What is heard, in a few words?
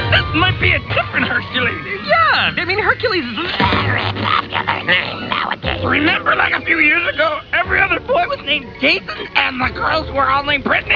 pain